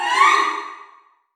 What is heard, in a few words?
Multi sting D; mcsm music; General Stings